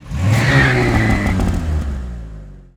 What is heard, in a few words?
wild hit; netent